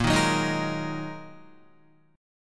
A sharp 7th Suspended 4th Sharp 5th down Guitar 4F 4A 5C 5G 5C 6E; A#7sus4#5 strummed